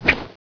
claw miss; oblivion darkangels

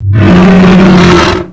nemesis pain; zombie plague

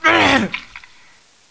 Pain Female

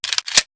clip in

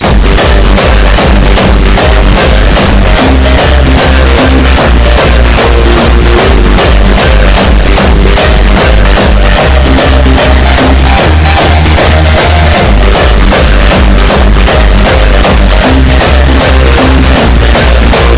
hardstyle

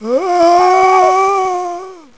Zombie Idle; cstrike

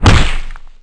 stab

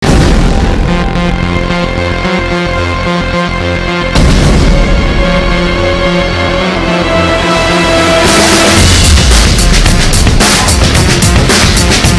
running; cstrike

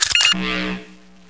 flare grenade; cstrike

